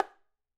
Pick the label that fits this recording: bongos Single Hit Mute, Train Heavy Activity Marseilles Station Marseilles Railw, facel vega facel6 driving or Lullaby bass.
bongos Single Hit Mute